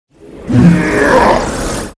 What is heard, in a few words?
Battle Normal Attack; Seer; GamigoNA